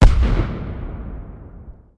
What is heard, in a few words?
gren kill